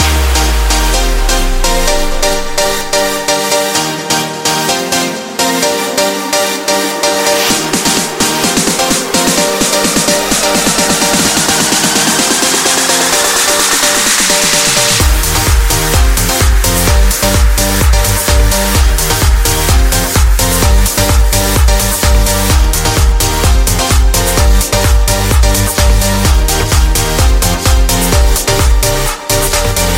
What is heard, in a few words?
best ringtone